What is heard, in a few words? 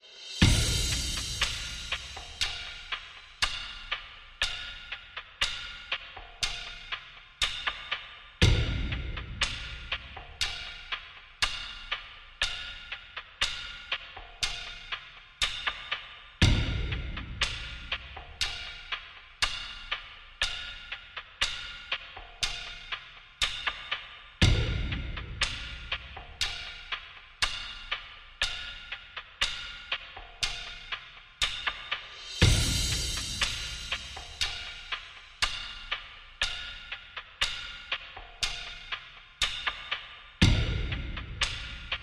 Drone, Only Rhythm